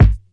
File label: hot kick single shot G sharp key, steel kick drum sound tuned to the G# note; kick drum samples